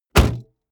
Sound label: car bmw e46 exterior bonnet close